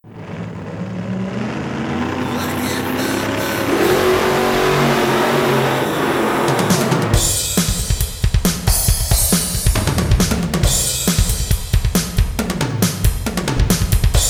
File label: vocals harmony; music smorgasbord